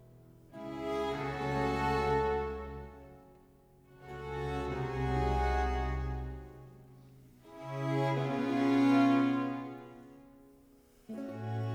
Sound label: Concerto grosso Adagio; No. 9 in F Major; Corelli; Concerti grossi, Op. 6